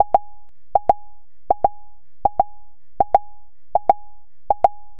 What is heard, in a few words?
pop mmmmmmwahh